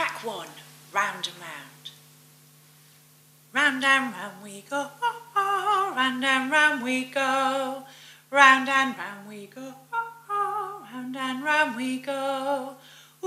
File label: Round and Round Vocal